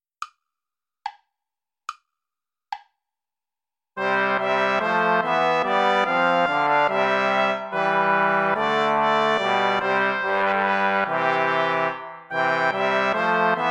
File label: play along without trumpet; praetorius michael; nach gra ner farb mein herz verlangt